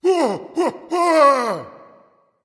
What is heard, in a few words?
fear, voice; smite; voicepack; ymir